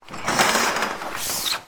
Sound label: household curtains heavy large pull